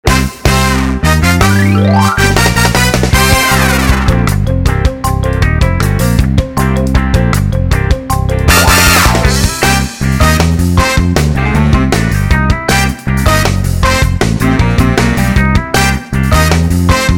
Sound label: free spin tune; lucky pups